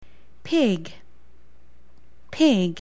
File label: pig